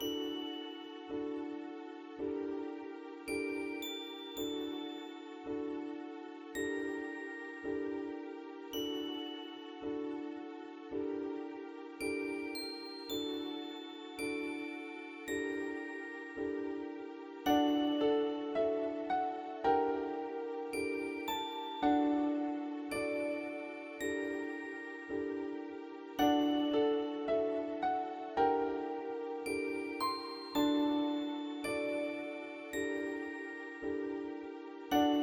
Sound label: Music Attic Loop